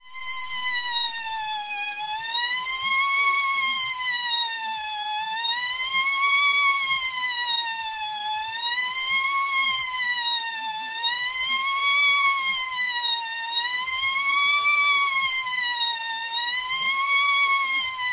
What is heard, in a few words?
Cartoon Police Siren